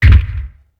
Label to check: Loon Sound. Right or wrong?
Wrong